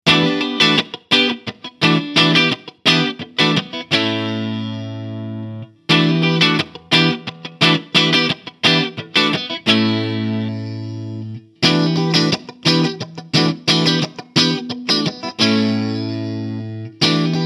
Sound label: Framus Idolmaker 5R Clean